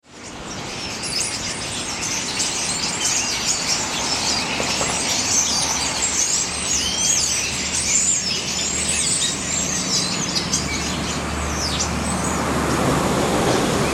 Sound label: blackbirds; 6.26am; storage environmental